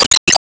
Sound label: double slash shot; gamerip; disney star smash; android